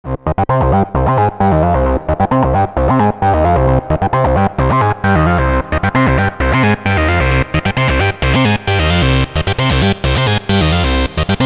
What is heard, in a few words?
midibox sid